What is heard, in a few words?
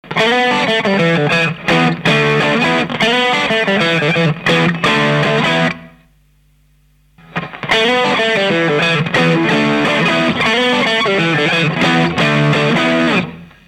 F-DRIVE ON; キットの指定通りに組上げた / F-DRIVEの音です。; 設定は前半がLEVEL3時 / DRIVE９時、TONE１時の / ブースター的セッティングで / 後半はLEVEL12時 / DRIVE3時TONE１時と / 歪みを深めにしてあります。